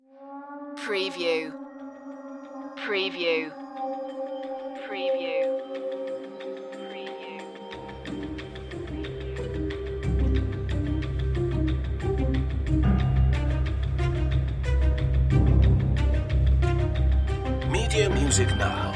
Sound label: big hollywood dramatic score